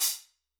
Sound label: White Soft Chiff, Hats; Airwindows